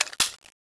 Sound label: scout clipout, weapons; cstrike